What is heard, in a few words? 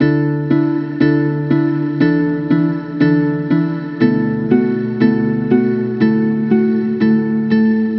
Musical Loop, 120, F Minor; Unseen; Ghosthack